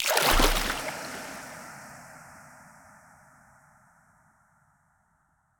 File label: Drop Into Water; Soulplane Start; Deora